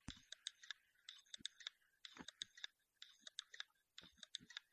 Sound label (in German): Defekte Seagate Festplatte Headcrash; Datenrettung